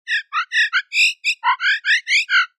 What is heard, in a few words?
magpie carol